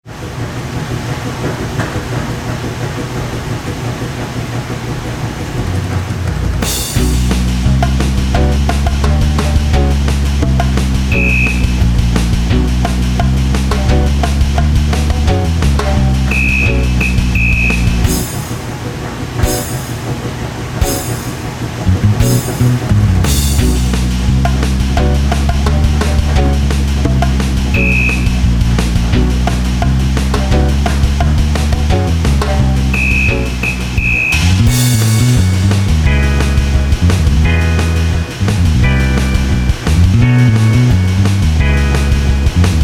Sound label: WASHING MACHINE